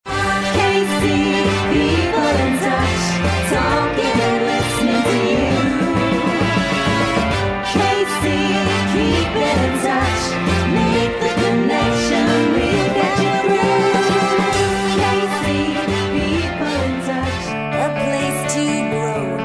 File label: singing